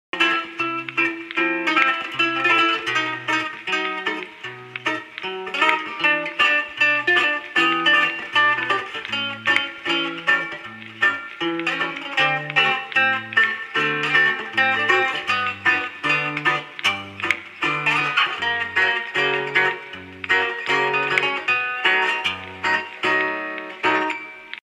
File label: Old Vinyl French Jazz Guitar; golf gang linux windows gamerip 2022